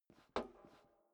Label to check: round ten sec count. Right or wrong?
Wrong